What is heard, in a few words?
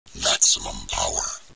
power